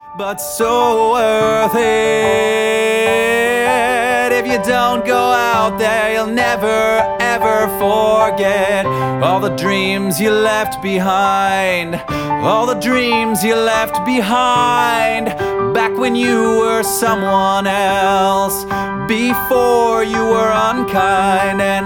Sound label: Singing Broadway